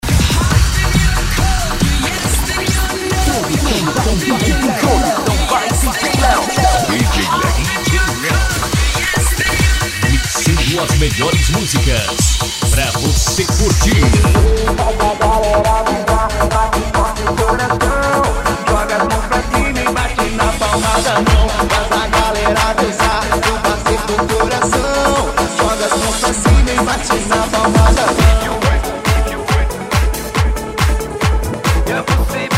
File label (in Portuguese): sertanejo remix e electro dance